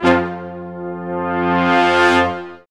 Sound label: BRS, Lrg Ensemble; Roland L-CD702